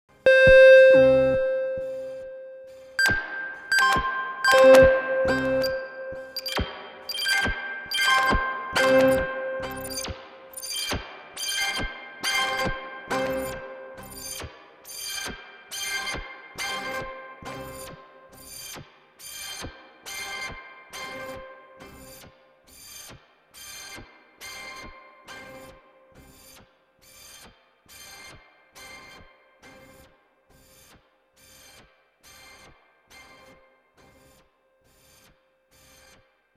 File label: Strymon Magneto Shift Tape Control w Step Sequencer Quarter Speed